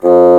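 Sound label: Bassoon p F1; Digital Sound Factory Virtuoso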